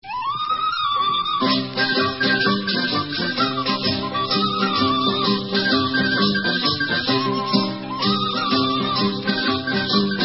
Tarantella Neapolitana, Italian Instrumental

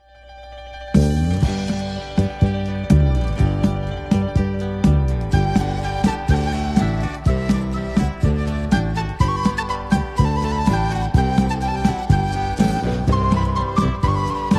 Debka Instrumental; Debka Esh Esh

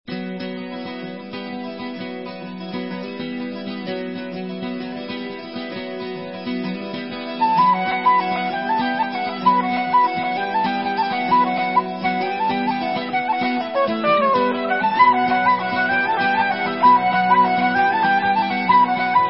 Celtic Festival Instrumental

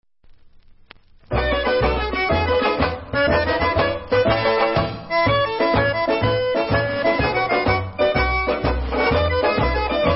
Boston Two Step, Instrumental

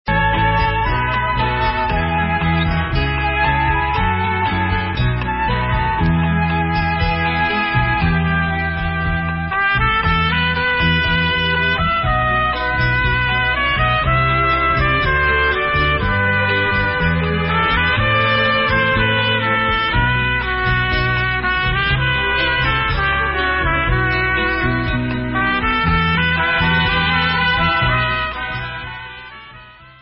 Instrumental

